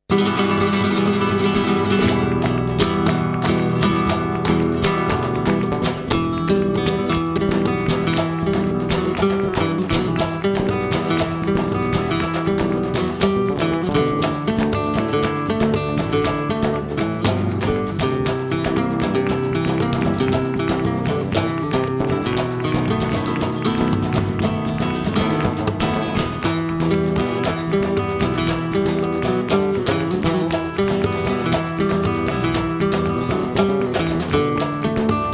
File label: rumba